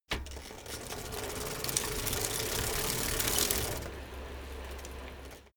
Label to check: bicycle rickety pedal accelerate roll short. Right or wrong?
Right